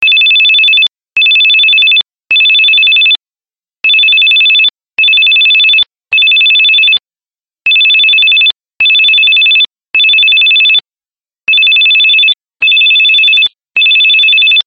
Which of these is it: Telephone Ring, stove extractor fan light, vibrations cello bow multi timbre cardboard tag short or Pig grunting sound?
Telephone Ring